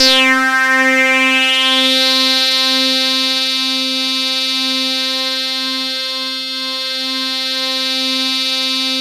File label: THE BUZZ, KURZWEIL K2000